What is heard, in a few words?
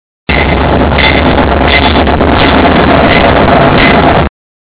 sound train engine